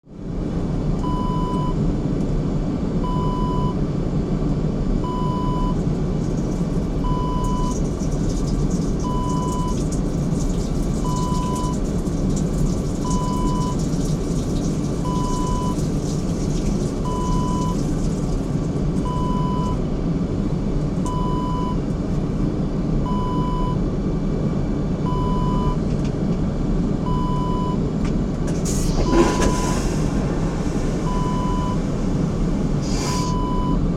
airplane toilet peeing

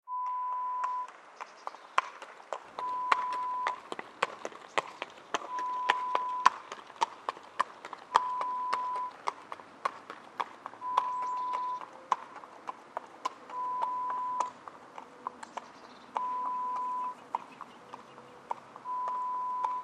Horse on road

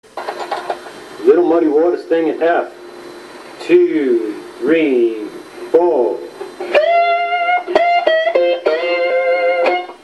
jam tracks Muddy

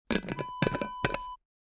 glass jar lid close

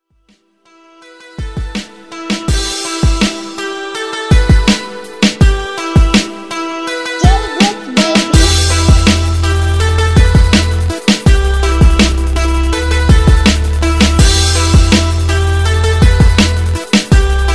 beat

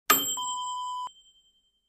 microwave bell

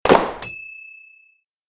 single gunshot

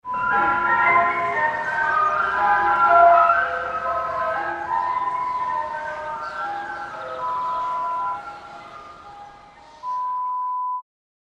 Ice Cream Van sound

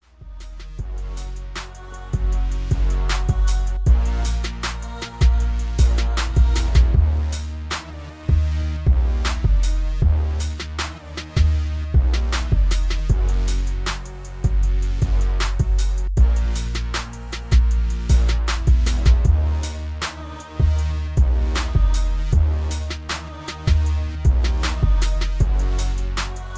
78BPM

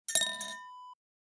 ice cube drop

